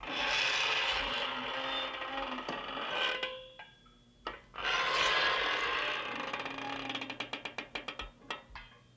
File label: Aluminum Door Screen Creak slow